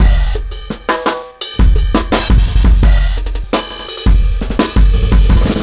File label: Beat 4-Bar, Breakbeats; KJ Sawka; Drum n Bass & Beyond